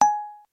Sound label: BBM Text Tight